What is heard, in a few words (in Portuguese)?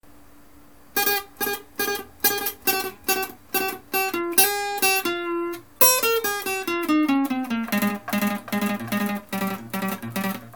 viola